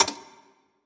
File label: SWITCH